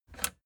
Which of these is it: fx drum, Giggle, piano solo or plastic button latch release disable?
plastic button latch release disable